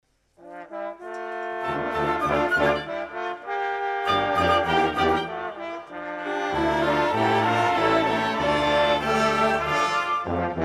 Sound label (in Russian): участие духового оркестра; г. Апшеронск; Молодежное общение